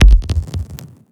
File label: Lat, Kik, One Shots; Sonic Foundry (Sony Creative Software) - Future Retro Dance Excursions